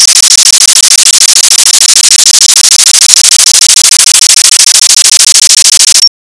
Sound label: waterfall